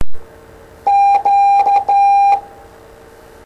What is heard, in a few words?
Morse Q